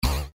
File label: cascade Land; Bouncy Balls 2